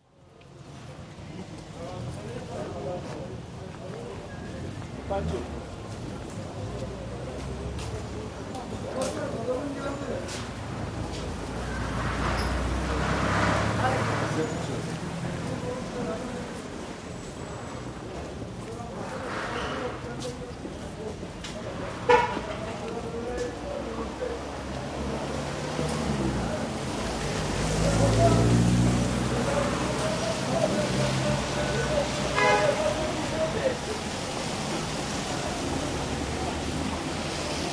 Istanbul, sidestreet, occasional traffic on wet road, shutters closed, footsteps and voices; City; BBC